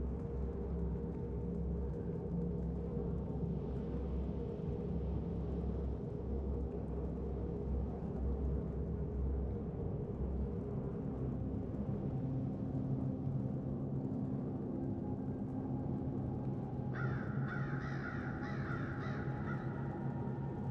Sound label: indoors, ambience; norona; pf2e; hells destiny